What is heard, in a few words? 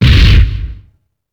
FLING; UFCTapout1; XBOX